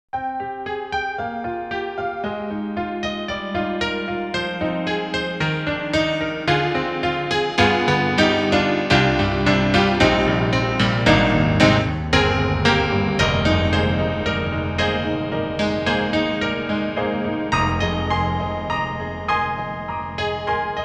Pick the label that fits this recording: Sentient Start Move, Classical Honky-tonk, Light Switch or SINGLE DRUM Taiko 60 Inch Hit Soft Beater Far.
Classical Honky-tonk